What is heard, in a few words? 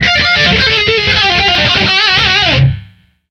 Guitar shred; Back to the Future: The Game; Ep. 1 It's About Time